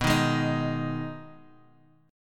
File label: B 6th B down, chord; strummed